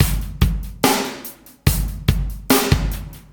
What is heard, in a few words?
CB LOOP; Zero-G; Chemical Beats